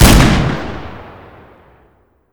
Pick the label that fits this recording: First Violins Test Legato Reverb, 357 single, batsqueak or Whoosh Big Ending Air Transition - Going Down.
357 single